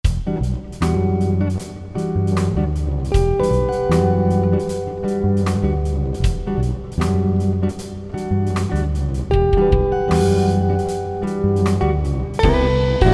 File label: blues; GTZAN